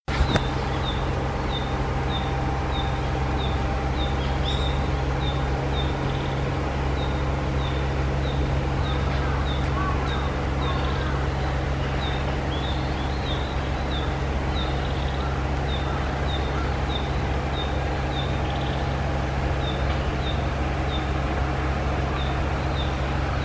キビタキ

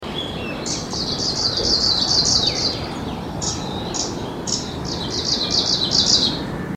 oomushikui

